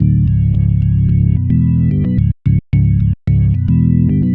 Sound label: Bass Line; Eclectic Electronica